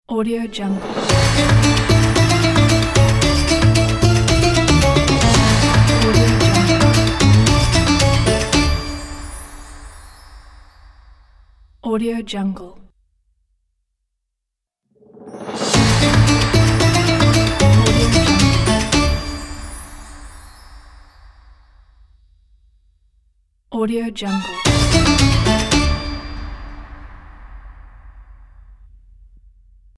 the turkish logo

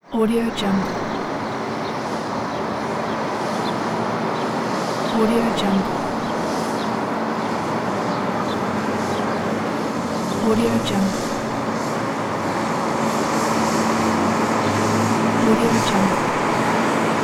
road sweeper machine

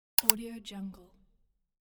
click; wistansound

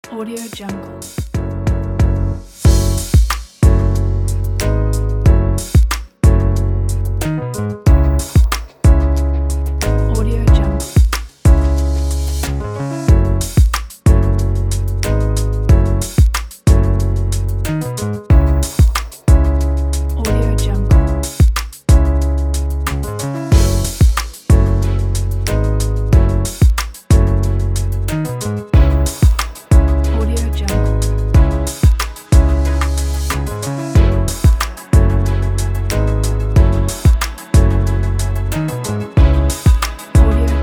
emotional hip hop

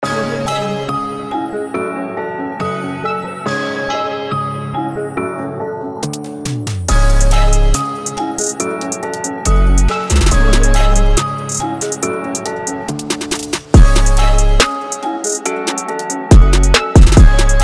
drill trap

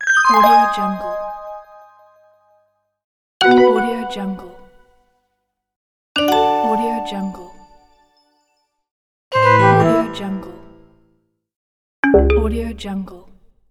interface menu sounds

